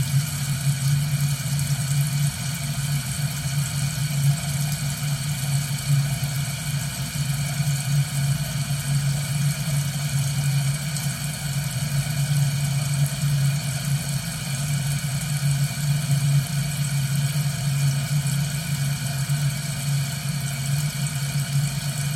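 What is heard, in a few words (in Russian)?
запись с контактного микрофона о наполнении тарелки из крана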